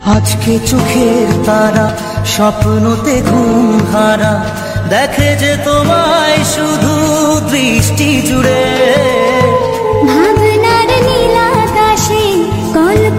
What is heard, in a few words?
Bengali Ringtone